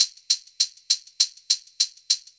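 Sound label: SHAK1 Right, FUNK; USB Soundscan vol.47; AKAI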